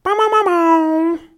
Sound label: air horn troll enter